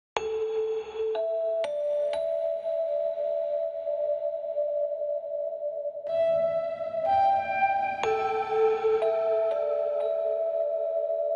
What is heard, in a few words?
Desert Music Melody Hermit Song Melody